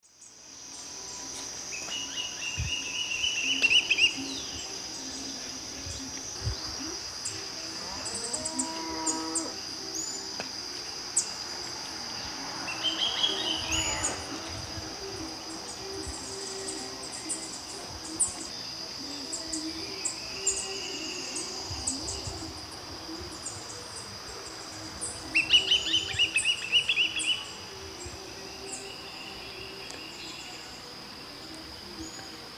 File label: Elanoides forficatus, flight calls; S. America; Eastern Lowlands